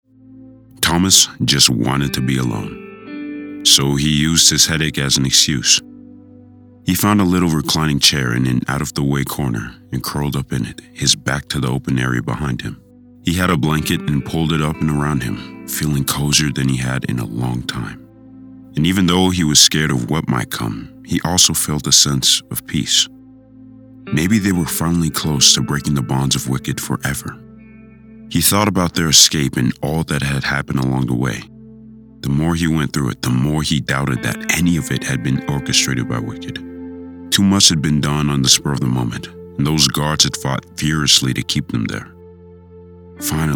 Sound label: Lead Vocal